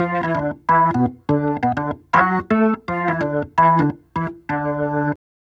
Organ Lick; Ambient Grooves; Organs Licks

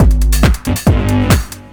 drum 138, Techno; drum-loops

